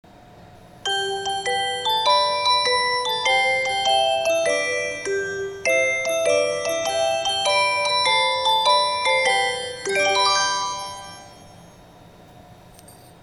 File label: chime; kyusyu